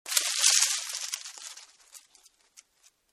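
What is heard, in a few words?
rustle of dry leaves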